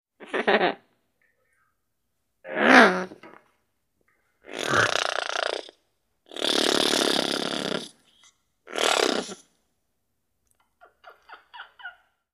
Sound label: Macaw Caws Several Bi